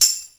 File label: tambourine; Greytsounds VOL-1; ORCH PERC 1